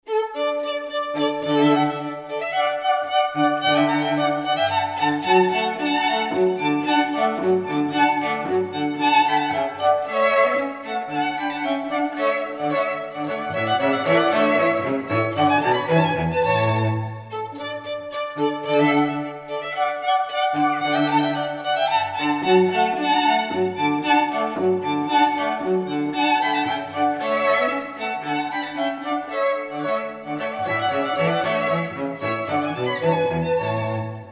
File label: string trio; string quartet; Handels La Rejouissance